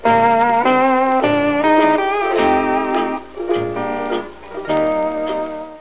Sound DANCE; My Science Fair

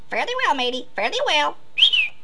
fare

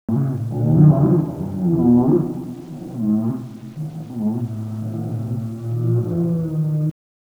Laugh Low

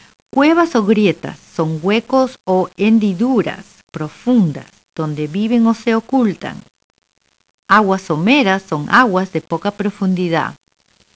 Cave trms

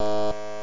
Score Bonus; PlayPac

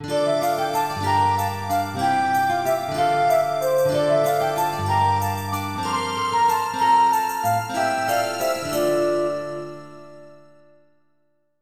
Midi; Castles - Siege and Conquest

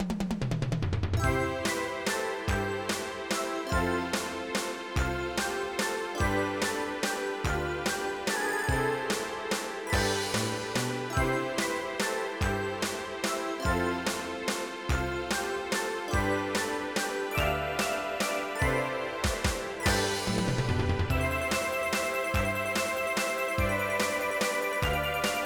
waltz; cubic

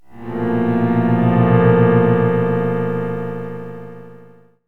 suspens, drama, music; KABOOM! - Wild and Wacky Sound Software

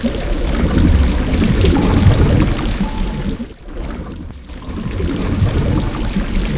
SEWER; Shadow

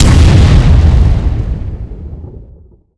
Large Explosion; warzone

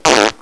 Fart; Kalaha v1.1

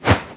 drop whoosh; vampire demo

